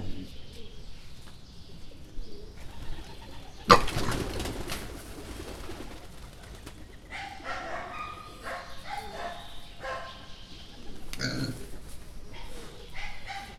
megijedt kismalac, mangalica; szegedi zoo 2011

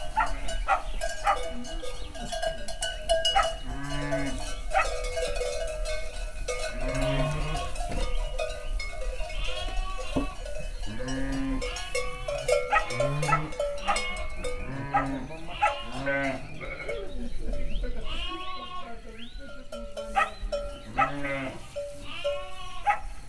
udvarban, juh; Egerszög

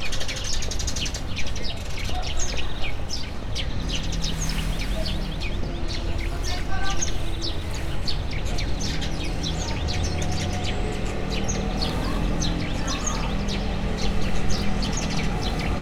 szárnyát rezegteti, erős környezeti zaj, páva; debreceni zoo 2012

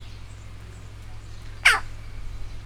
szellő előtt, csóka; állathangok; miskolci zoo 2018

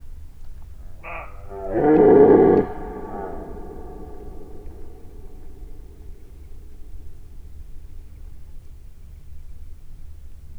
gímszarvas is, európai őz; termeszetben

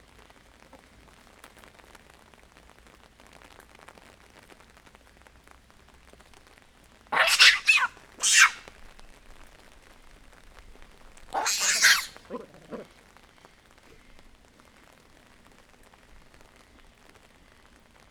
kapcsolatban egymással, galléros pávián; pecsi zoo 2017

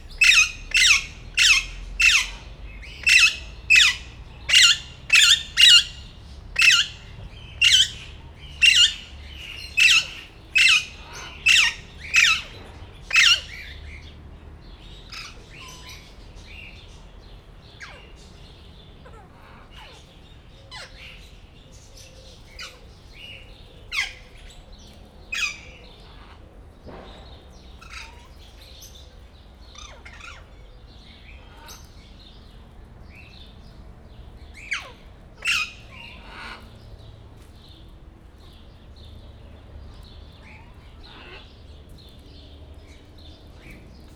eredendő hangja, kéksárga arapapagáj; Győri Zoo 2014

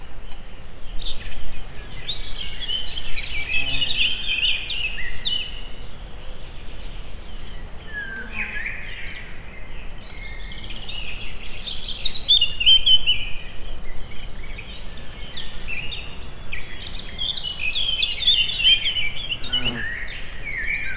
közelről, rovarok; termeszetben; egerturistahaz

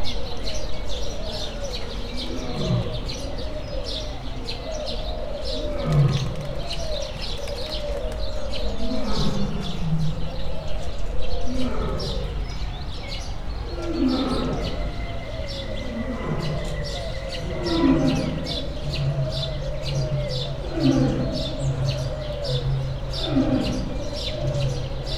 távolról hallhatóak, oroszlán; debreceni zoo 2012

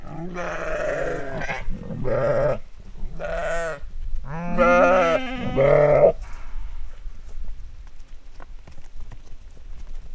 vonulnak, juh; állathangok; paraszt udvar

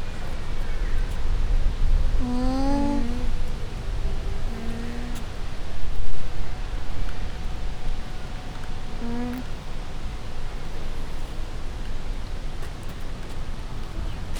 párbeszédet folytatnak szél, láma; Győri Zoo 2014

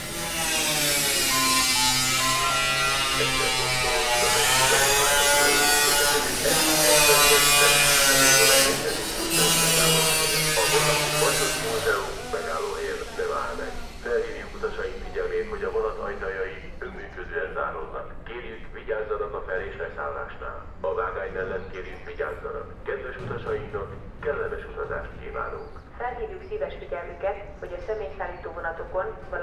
mav hangosbemondo korfuresszel, vonat allomasrol kiindulo

